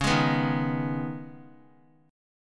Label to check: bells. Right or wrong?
Wrong